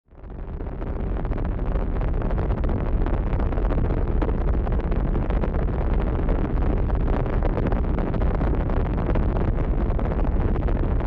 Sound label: Spacecraft reactor rumble